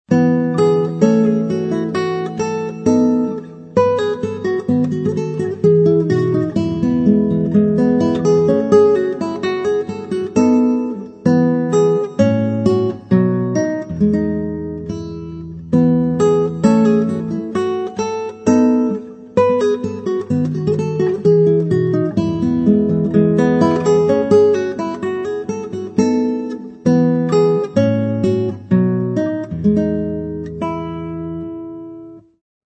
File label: solo guitar gavotte; bach